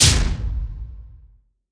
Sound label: Impact Slam; One Shots; FX; Ableton Live 12 Lite; Core Library